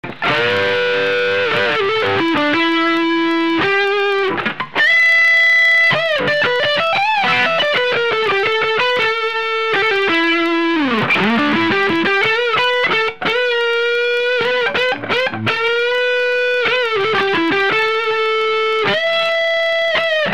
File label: CS-3 mod dist